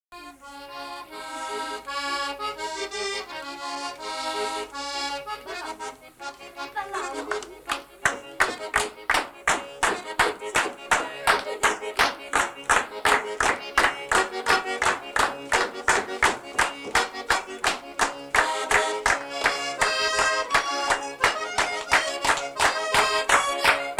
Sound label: Recorded in Lettino, Caserta - Tarantella (Accordion & Clapping)